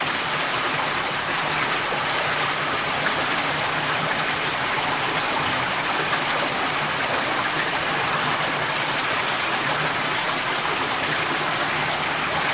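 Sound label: water sounds; zvuki vody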